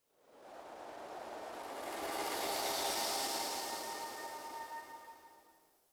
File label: Cine Woosh; From Dust; ps3; xbox 360